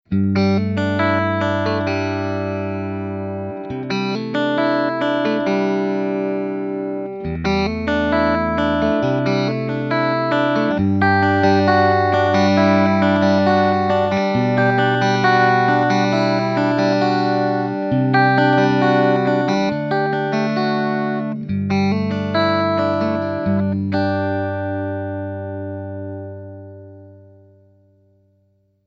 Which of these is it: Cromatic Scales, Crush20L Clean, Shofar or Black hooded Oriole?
Crush20L Clean